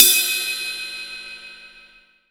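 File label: power cymbal, Ride Cymbal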